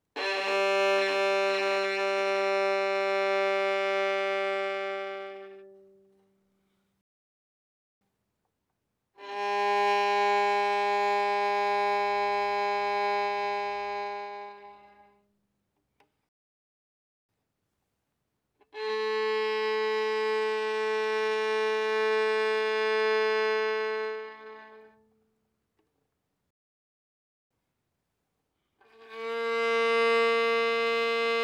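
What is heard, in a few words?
Violin arco fortissimo on G string G3 B3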